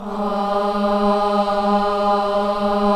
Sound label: KIDS CHOIR, Gospel